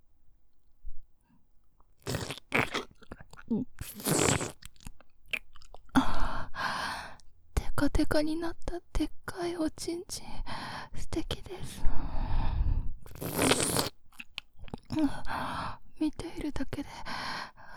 ジュボ、ジュボ…はぁ; テカテカになったでっかいおちんちん

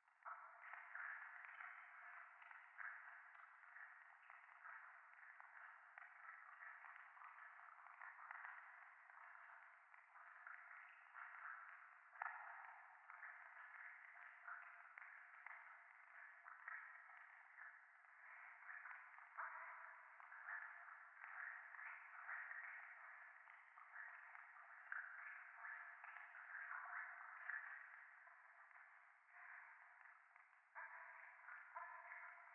廃墟 フェラチオ 暖炉

